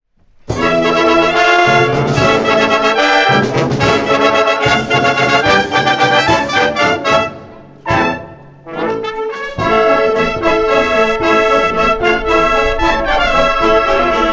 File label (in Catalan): ball; Ball dels Contrabandistes Sierra Morena; Els Contrabandistes (Banda)